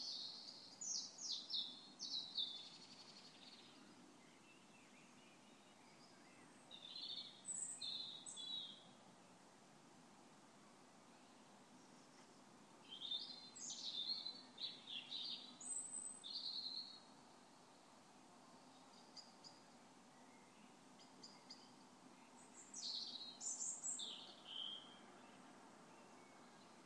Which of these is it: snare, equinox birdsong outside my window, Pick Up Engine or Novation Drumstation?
equinox birdsong outside my window